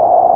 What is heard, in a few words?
PICH NOIZ